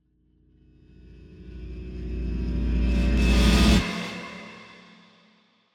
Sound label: String Riser, Horror Jumpscares; DSGNRise; Justsoundeffects